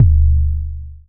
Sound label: big kick sound g key